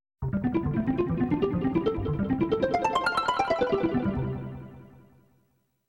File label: Rainbow Harp